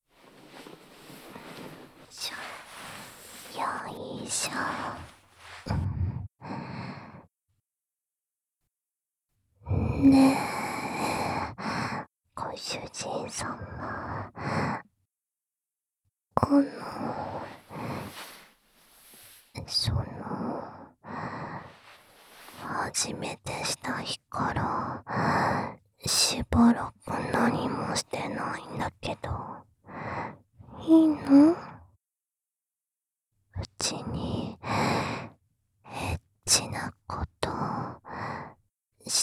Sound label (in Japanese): 耳舐め フェラ 乳首舐め 射精音あり; 発情期マタタビでふにゃふにゃドスケベご奉仕; プレミアム猫ver; ダウナー猫娘; フォーリープレミアムにゃんこサウンド; KU100収録